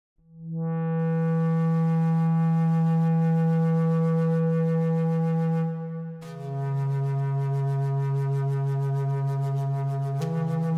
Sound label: native american flute